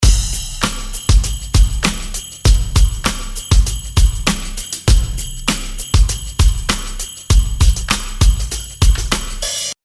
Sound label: Kick Beats; pack noel